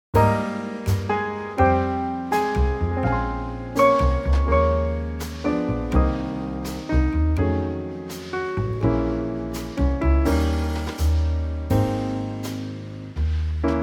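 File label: Ab, Backing Tracks for Male Vocals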